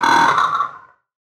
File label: damage, fractal guardian; Legacy